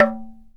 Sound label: CUICA, World Percussion; Synclavier Percussion; World & Orchestral; ILIO